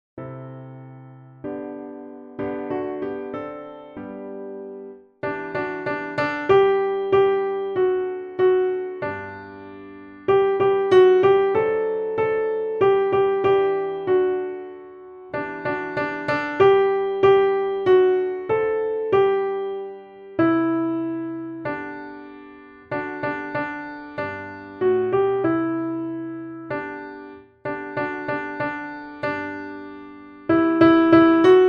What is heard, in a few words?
piano playing the alto parts